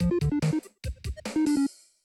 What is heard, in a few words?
test bgm